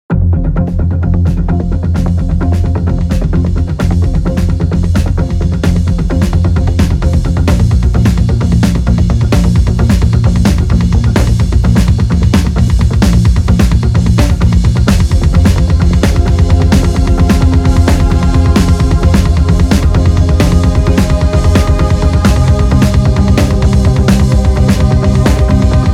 soundtracks

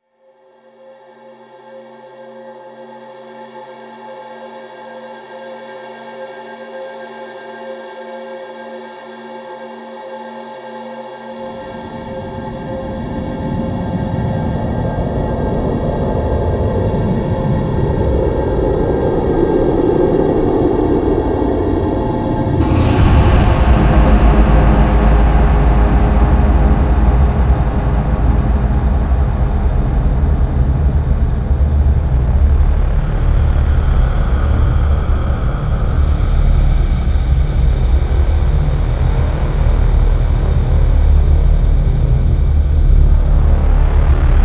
soundtracks

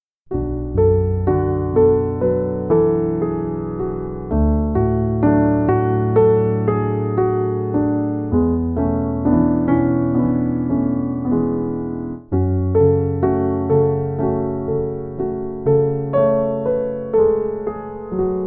piano

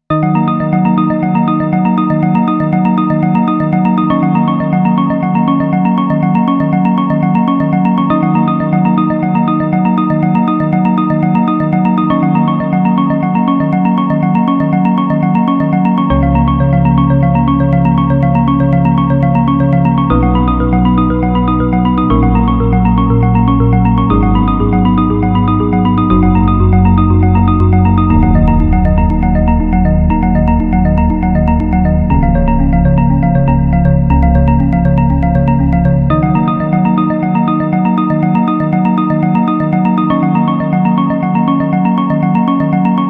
folk music

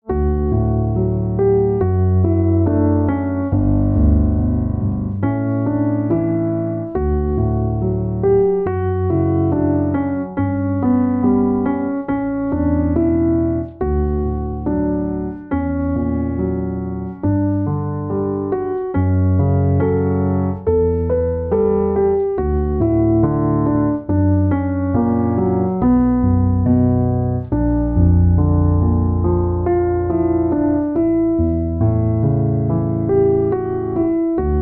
70 bpm, piano

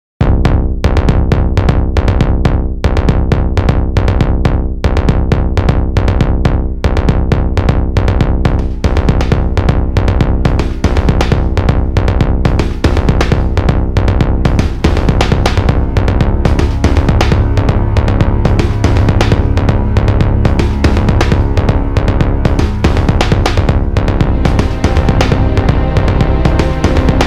folk music

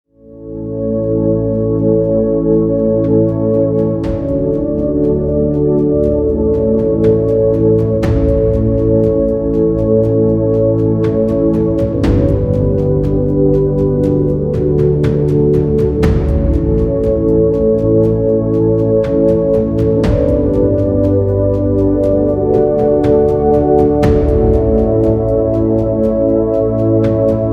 soundtracks, 120 bpm

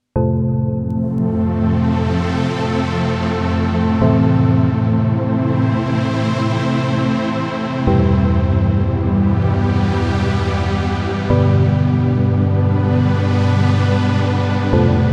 soundtracks

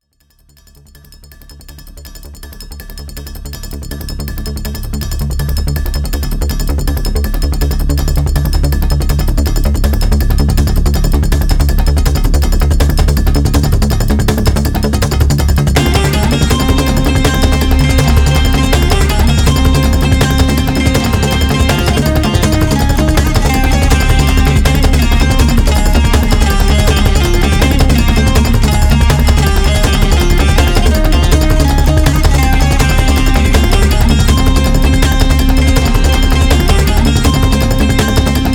folk music, 162 bpm

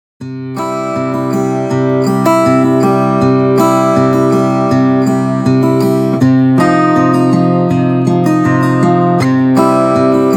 folk music

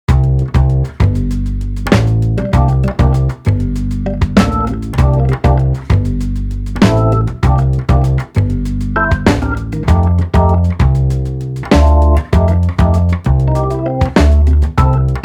blues

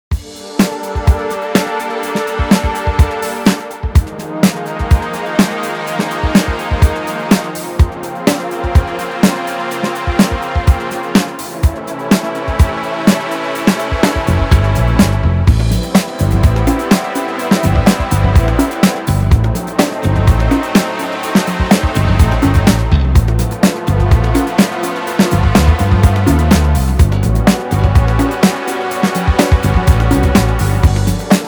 soundtracks

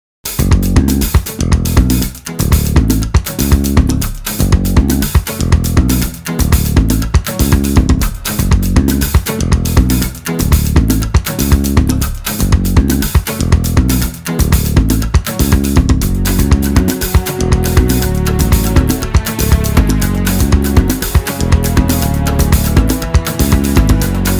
folk music

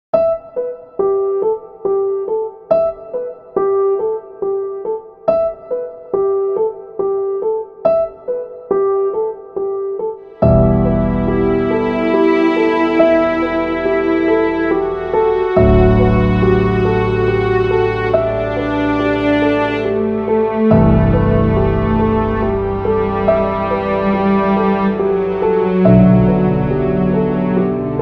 soundtracks

